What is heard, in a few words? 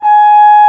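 French Horn mf G#4; Digital Sound Factory Virtuoso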